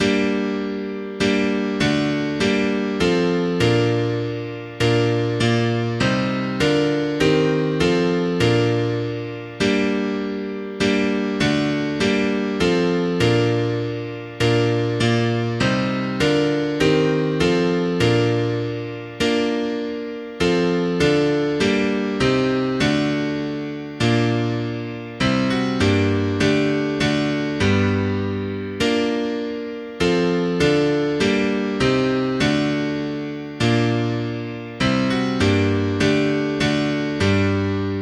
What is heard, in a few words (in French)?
pavane